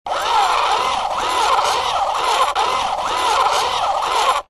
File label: science fiction robot small tracks wheels motor whirr short bursts walking